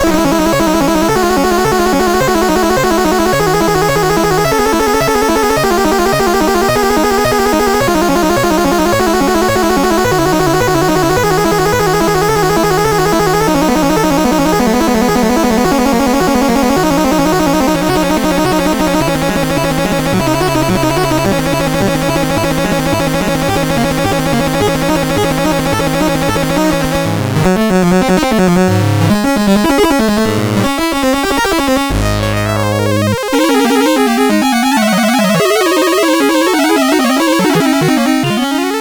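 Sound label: River Chase, MOS 8580 SID chip music, games; CSG8580R5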